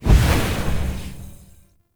Soul Steal Activate; Powers

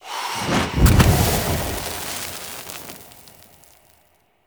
Blaze Armour Activate Cast; Powers; Fenn

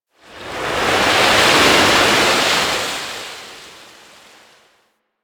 Beach Wave Crash; Ambience; Warframe; Grineer Beach

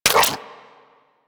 Inf Punch Life Steal A; Warframe; Weapons; Infested; Inf Kick Punch

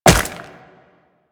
Wall Jump Ice; Footsteps; Warframe; Tenno; Wall Run

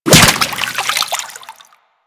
Infested Whip Impact Water

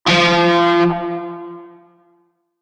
Shawzin Oct Two F; Music; Warframe; Narmer Shawzin

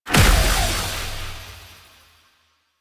Distill Ability Impact; Warframe; Warframes; Alchemist

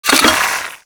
Secondary Mech, Weapons Cannon; Kuva Zarr

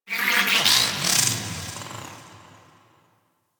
Sentient Lair Exterior Creature

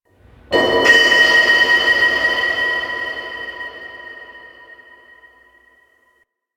Hub Metal One Off; Steel Meridian; Warframe; Tenno

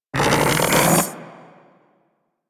Auto Crossbow Charge Up; Warframe; Tenno

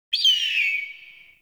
Mountain Forest Predatory Bird Far

